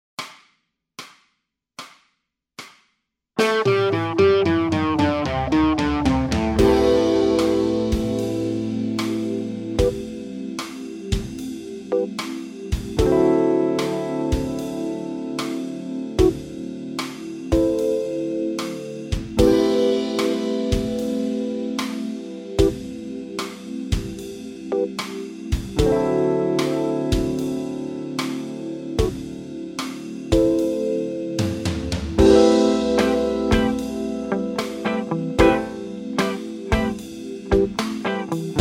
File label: bass blues funk, backing tracks